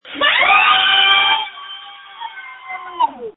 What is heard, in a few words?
screams